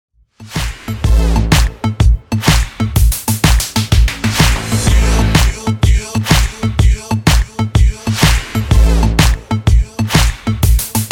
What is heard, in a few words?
Epic Intro